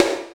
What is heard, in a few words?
DMA XD-5, DIGITAL